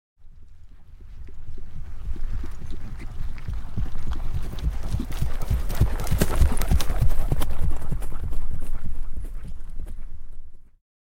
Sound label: Single Horse Trot By; Historical; Hollywood Edge Historical Series; War Horses Armies & Weapons